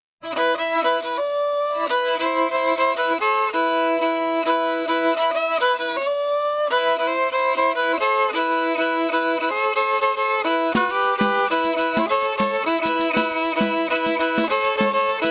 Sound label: Deep Samba